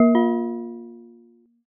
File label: china gong